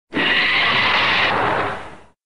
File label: Roar; Sanda; Godman; Tyrant